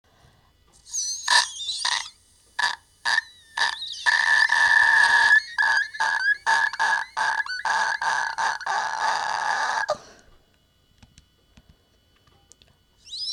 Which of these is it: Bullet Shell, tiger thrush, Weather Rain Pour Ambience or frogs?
tiger thrush